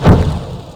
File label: weapon fire